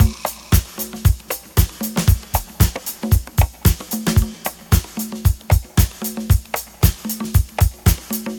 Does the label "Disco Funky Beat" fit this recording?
Yes